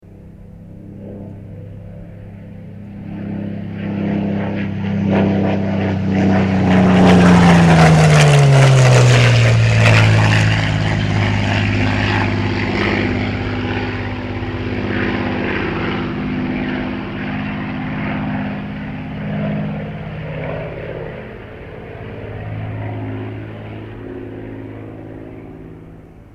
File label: aircraft supermarine spitfire mk-viii pass; 1944